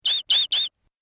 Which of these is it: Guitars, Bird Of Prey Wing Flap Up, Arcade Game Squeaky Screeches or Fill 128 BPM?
Arcade Game Squeaky Screeches